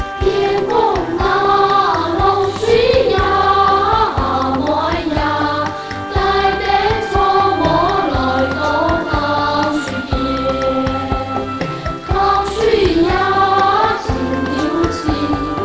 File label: hakka song